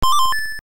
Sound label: jingle practice done